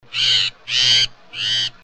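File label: Hutton's Vireo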